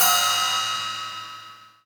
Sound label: Ride, Cymbals; Vengeance Samples